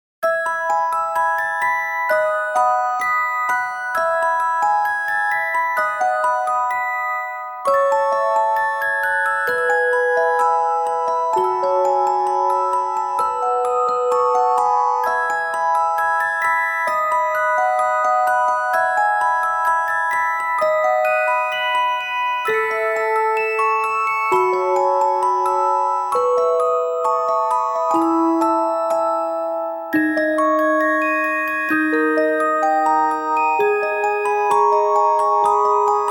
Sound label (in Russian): muzykalnaja shkatulka s rozhdestvenskoj muzykoj; Новогодняя музыка